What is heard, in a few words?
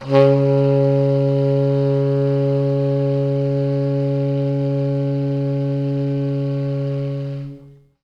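T Sax, tenor sax; B. Webster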